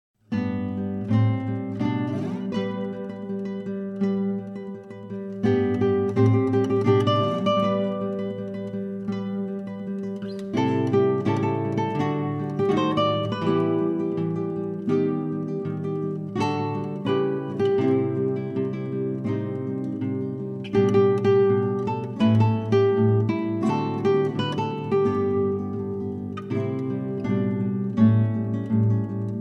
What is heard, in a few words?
allguitar